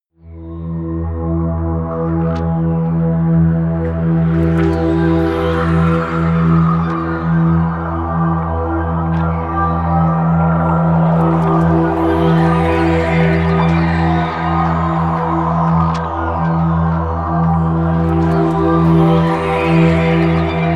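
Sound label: sadness, music elements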